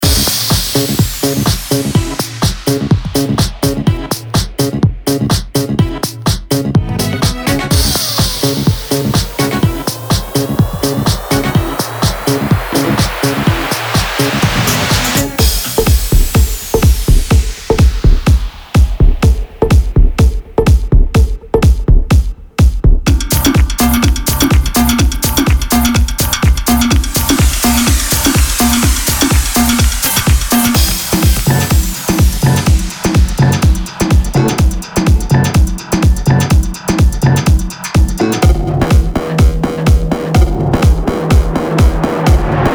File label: Disco